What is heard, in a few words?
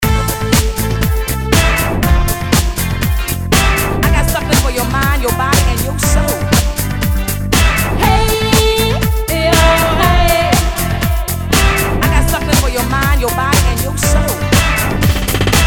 POP